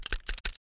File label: deagle special look at; weapons; cstrike; csgo